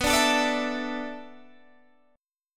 B Minor 11th B down Guitar Standard; Bm11; strummed; capo=2

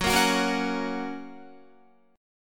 Gb Major, Guitar; Gb strummed; JGuitar

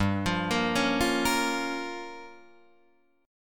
G Minor 7th Flat 5th, Guitar Drop D

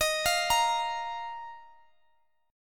D sharp Suspended 2nd, Guitar, Open G

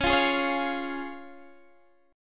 C sharp Major, Guitar, Standard; strummed; JGuitar

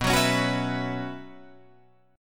B Suspended 2nd Suspended 4th, Guitar, Drop D

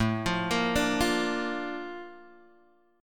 Bb Major A, chord; Bb/A arpeggiated; JGuitar

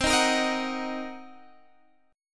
C Minor 7th Flat 5th, Guitar, Standard; Cm7b5 strummed; JGuitar

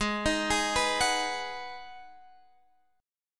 Ab Minor 7th down Guitar Standard; arpeggiated; JGuitar

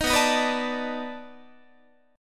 B 7th Sharp 9th, down, Guitar; B7#9; strummed

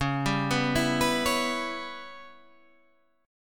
Db Minor 7th Flat 5th Db Guitar Standard; arpeggiated; 9,10,9,9,12,9

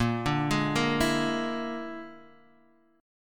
Bb 7th Flat 5th Bb, Guitar Open D

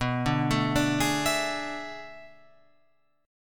B Minor 13th B down Guitar Open F; Bm13 arpeggiated; JGuitar

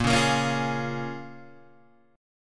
A sharp Minor 7th, A sharp, down, Guitar, Open G

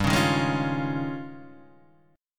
D sharp 11th G

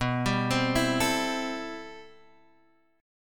C 6th B, C6/B chord; C6/B arpeggiated

